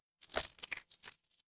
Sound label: cartoon splat